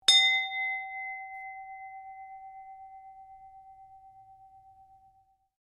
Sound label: Bell Brass Large Ring, Varied brass bells